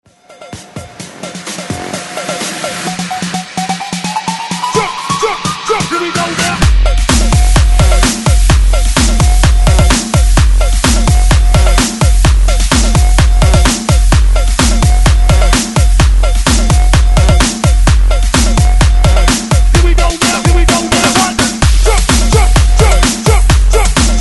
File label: 128 BREAKMIX